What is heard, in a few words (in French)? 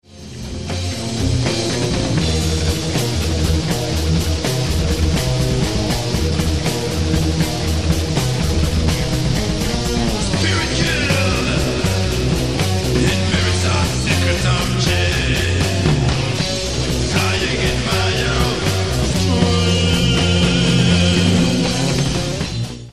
basse chant